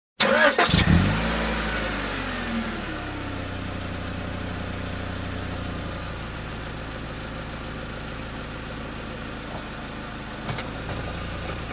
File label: Car engine ignition and backing off